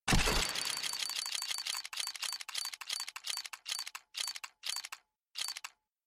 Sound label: roll